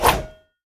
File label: sweep, player attack; Minecraft; Conquest Default